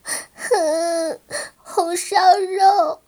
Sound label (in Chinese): 死亡, BattleCry; 莉莉